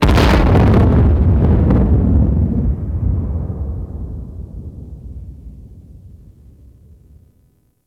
Explosion Space Battle Distant; Ambience; Warframe

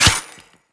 Melee Dagger Impact Robotic Flesh

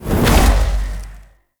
Void Dragon Orb Return; Enemies; Abilities; Warframe; Duviri

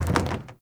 Pylon Plastic Roll; Ambience; Gameplay; Warframe; Nineteen Ninety Nine

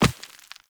Operator Walk Rock, Footsteps; Warframe

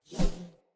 Player Short Sword Charge Whoosh; Weapons; Swords

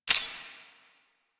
UI Mod Install; Warframe; Railjack Player Ship

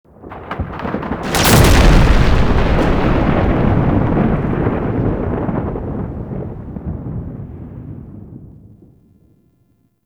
Sky Thunder Clap; Ambience; Warframe; Duviri; Murmur

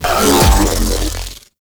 Spawn; Warframe; Sentients; Repeater

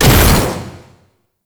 Taller Door Open Mech; Ambience; Gameplay; Warframe; Entrati

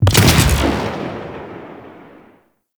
Mortar Moa Launch; Warframe; Venus; Venus Terra Mortar Moa; Abilities

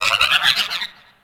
Venus Landscape Cave Creature Calls; Warframe; Venus Open World; Ambience

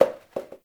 Janitor Paper Towel Drop; Warframe; Ambience; NineteenNinetyNine

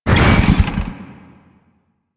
Motorcycle Stop; Vehicles; Warframe; Motorcycle Ninety Nine

